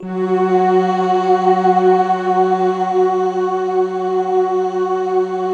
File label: future choir, Band; Optical Media International; Sonic Images Library